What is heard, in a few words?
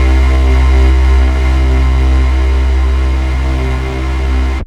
SYN BASS